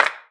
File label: Clap; osu